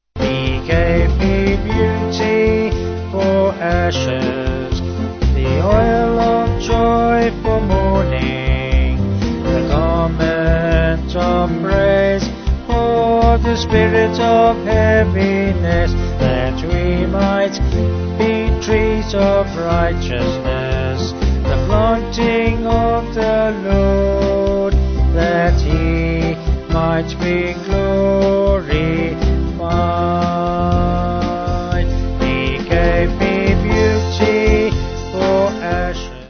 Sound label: Vocals Band